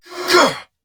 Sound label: Attack Effort